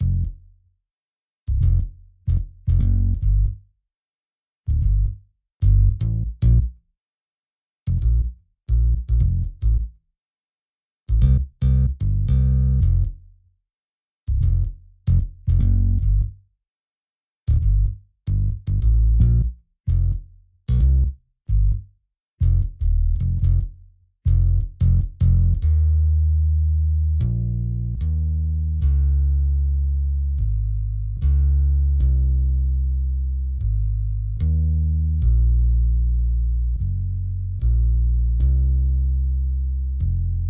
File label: bass; Toki Tori 2+; Toki Tori Collection